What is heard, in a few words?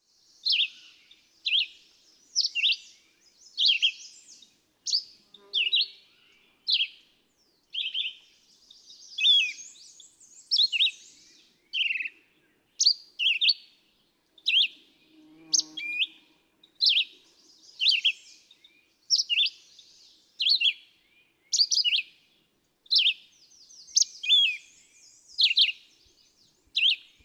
Red-eyed Vireo; Song, from Massachusetts. May 21, 2012. Quabbin Park, Ware, Massachusetts.